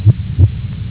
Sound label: human heartbeat scary